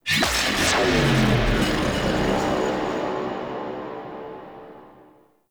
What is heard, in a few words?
Power Gauss; Ambience; Vitruvian Museum; Warframe; Warframe Powers